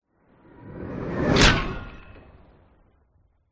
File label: sound design whoosh fast fly impact metal; zapsplat